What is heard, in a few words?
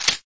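P250 magazine in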